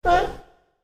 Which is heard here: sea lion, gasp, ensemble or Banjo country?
sea lion